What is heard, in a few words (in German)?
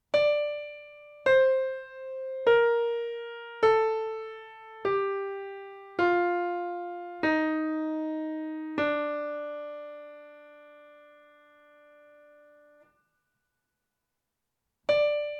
Tonleitern hoeren